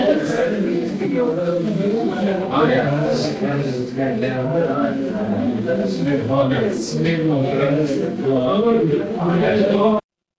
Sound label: crowd murmur